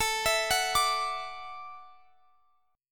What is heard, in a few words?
F sharp Minor 7th Sharp 5th